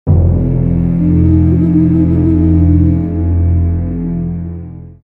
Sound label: Game Over